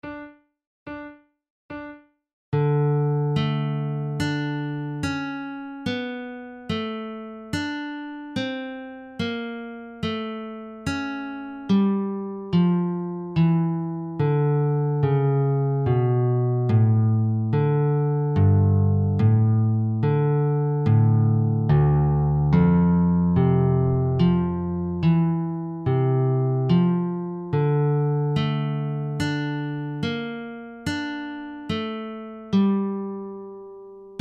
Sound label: air in d minor, flute; purcell; the double dealer